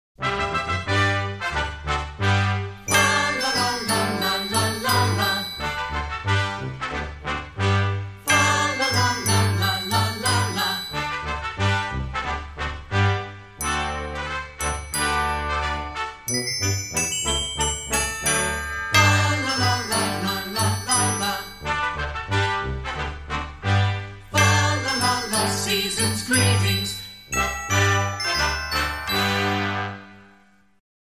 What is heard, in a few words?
Deck The Halls, Brass Band and Small Group